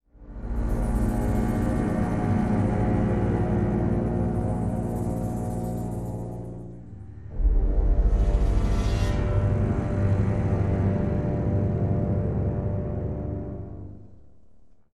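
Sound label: stinger ambient slow swell; transformers war for cybertron gamerip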